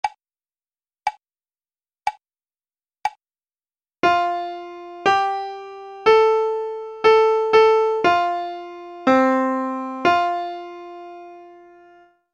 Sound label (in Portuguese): imitacao melodica; Processo Seletivo UFAL 2018 - Curso de Licenciatura em Musica